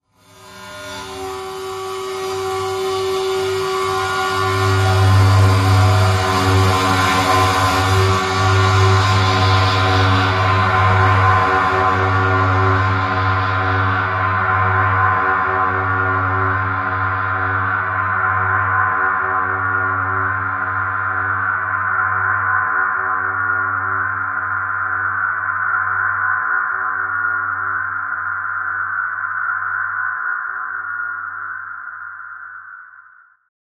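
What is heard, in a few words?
Splash Cymbol Bow Mod; Splash 8 Cymbol Strike 1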